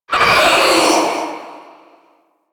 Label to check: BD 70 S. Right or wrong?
Wrong